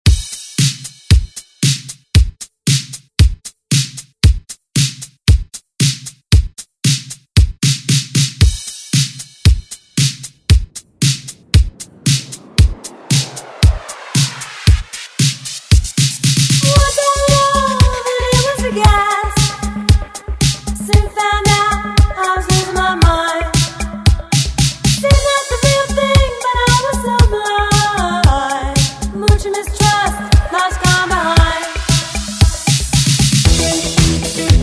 ReDrum; DJ Mhark

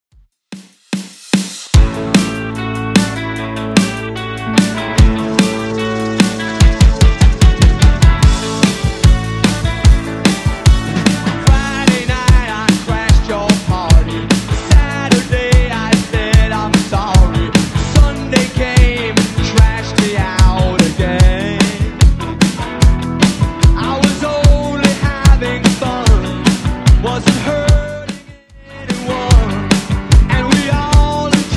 80s Real Drum 148bpm

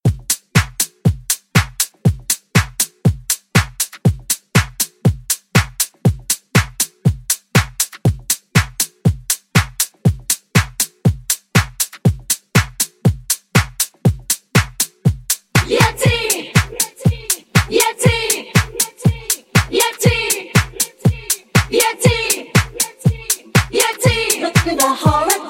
80s Upbeat Redrum; Remixfreakz

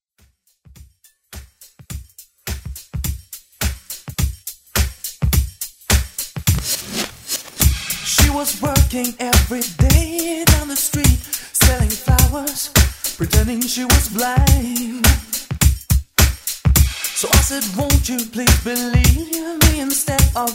Drum Pella Intro

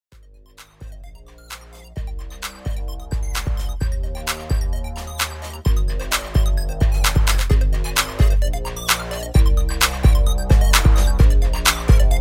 DJ intro Club HipHop 130 Bpm

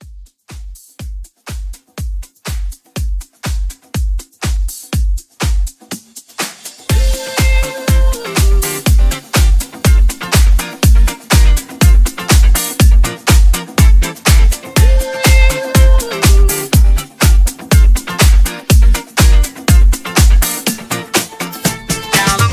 nudisco mashup 122 clean

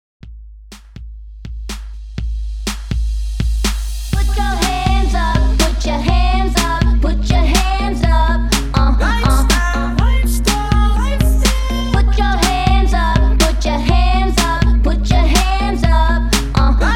HYPE REDRUM 123 BPM

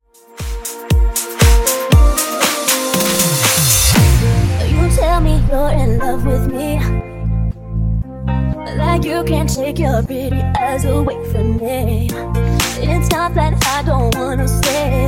Funk Nudisco Edit 128Bpm

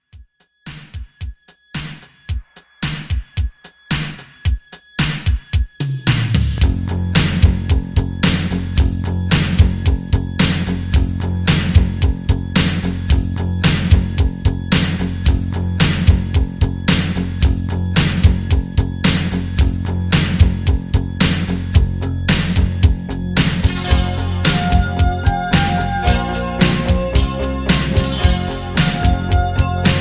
80s Re Drum

